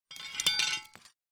工具碰撞

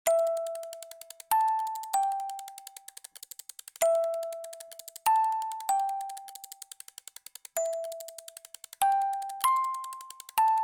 操纵八音盒

